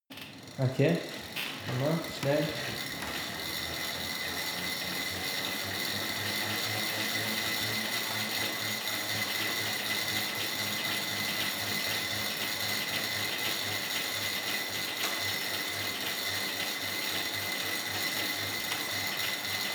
indoor bike sequence hard, fitnessstudio; sport freizeit

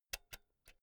pull out jack cable slow; objekte; elektronik

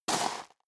step leather shoes gravel medium slide short; schritte

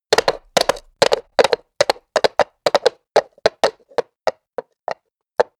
horse hooves clack gallop asphalt slowdown; natur-tiere; pferde

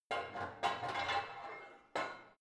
barbell heavy plate put on creaky, fitnessstudio

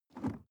sports car int pedal gas release; verkehr transport; autos

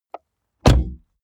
car bmw e46 exterior door front close; Cars

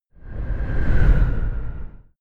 sci-fi vehicle train passing fast short; raumschiff

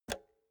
pull out xlr multi socket hard; objekte; elektronik

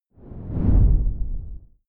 whoosh simple low short grainy

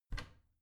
bathroom cabinet both open soft

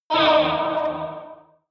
evil choir hit short high, hits impacts

